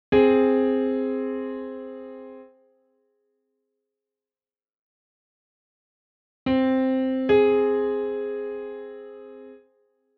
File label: interval